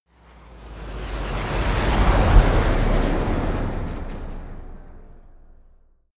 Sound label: flyby